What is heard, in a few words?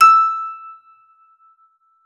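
harpsichord E6; KLAVICYMB; Xsample VOL-8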